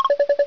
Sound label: BLEEP